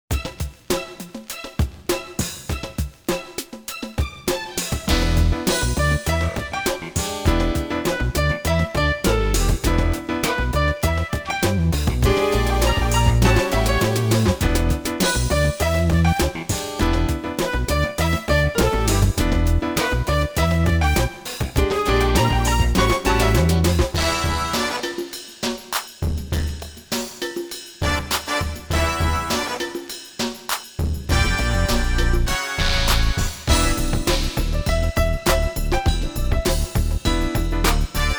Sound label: acid bop